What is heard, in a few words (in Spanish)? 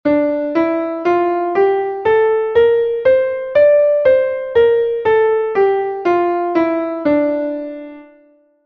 re menor natural